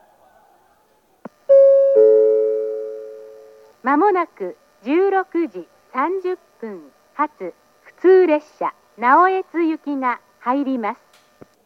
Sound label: arai local, station; jr-east; naoetsu